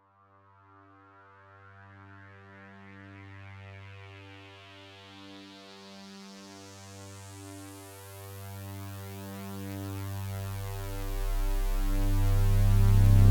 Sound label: uplifter